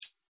Hi Hat Closed

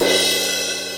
Crash Cymbal

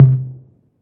Tom Mid

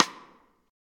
Rimshot

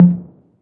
Tom Hi

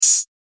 Hi Hat Short

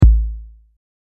Bass Hit, drumkit

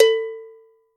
Cow Bell

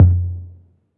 Tom Low

